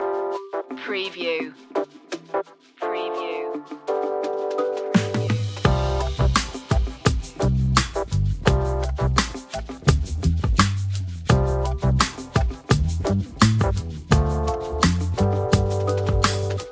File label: easy hip hop